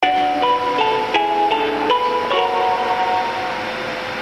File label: bus chime; 国際興業バス